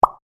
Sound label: pop